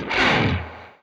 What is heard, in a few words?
PINKNOISE; Quantum Leap; Guitar & Bass